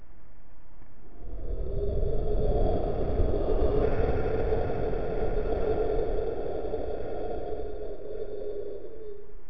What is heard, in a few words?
wind